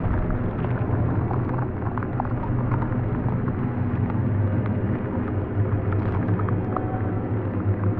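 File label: lithoid ambient corvette hum; Stellaris; lithoids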